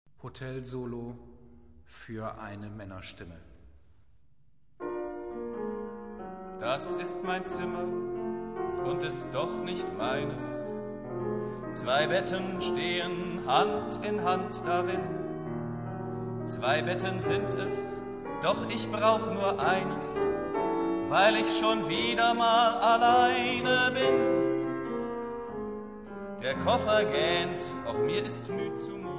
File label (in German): Hotelsolo fuer eine Maennerstimme; Ausser man tut es